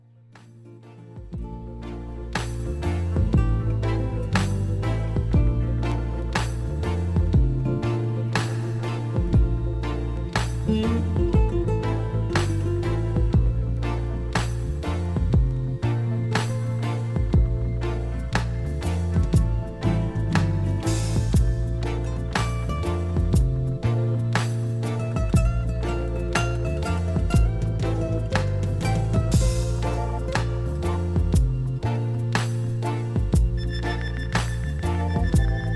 Dub Lounge

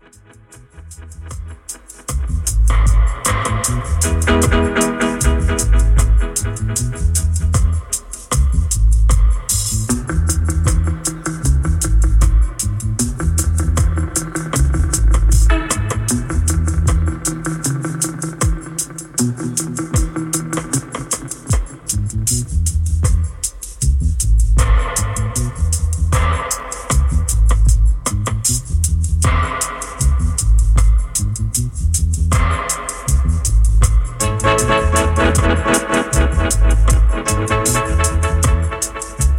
Reggae Music Dub

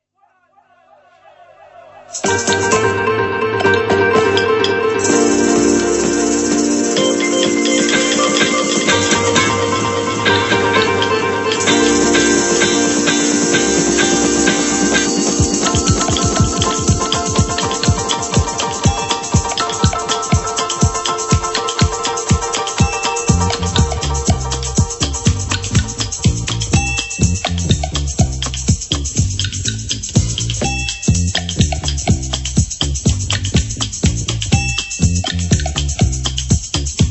Reggae Music Dub

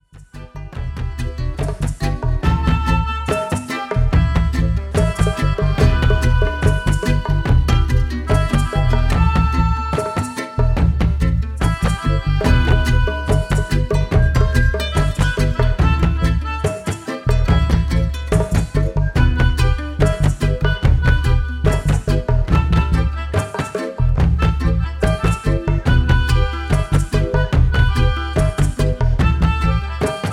Ethiopian Binghi Drums

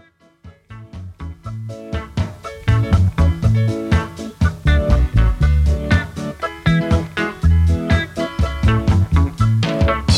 Easy Ride Reggae (Instrumental)

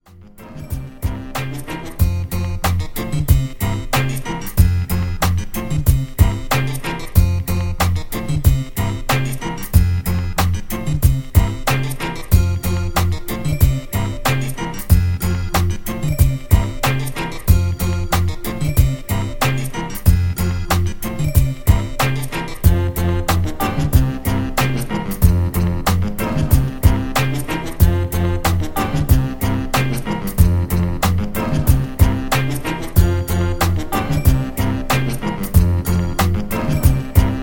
Instrumental Dub

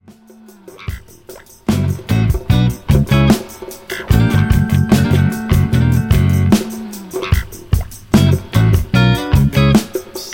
Rub-A-Dub Instrumental

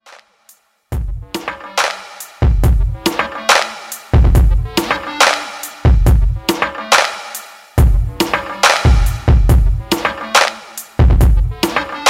Dub

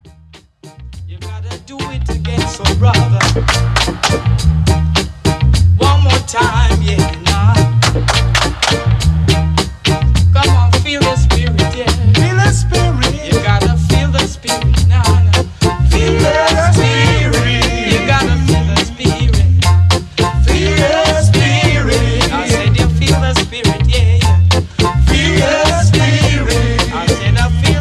Clap Clap

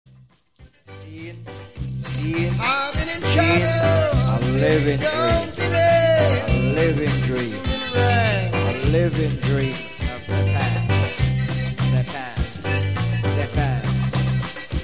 Drum Song

